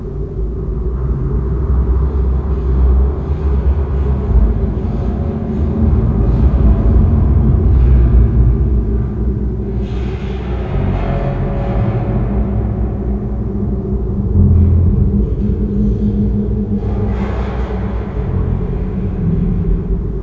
warehouse ambience loop; cstrike

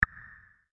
beep; mujik

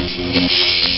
electric current; cstrike

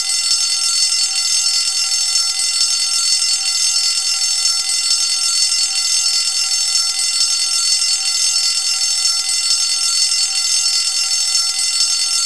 city firebell loop; ambience; cstrike

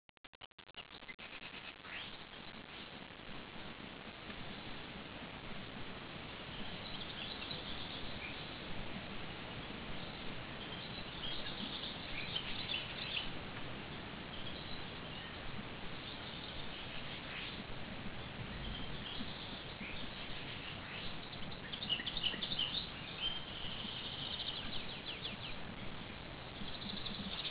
birds

